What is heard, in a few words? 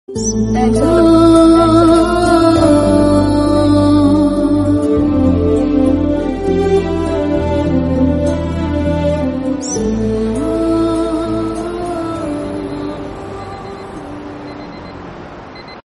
Telugu Movie Background Music; Solo